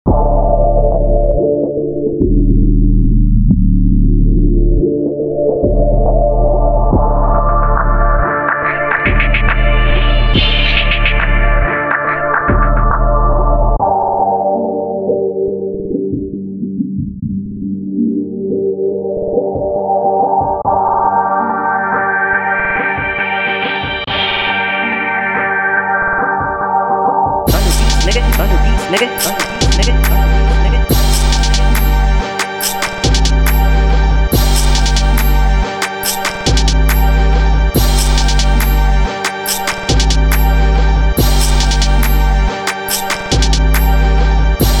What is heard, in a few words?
Instrumental